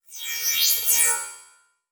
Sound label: Title Transition Electrical Pulse; Adobe; Production Element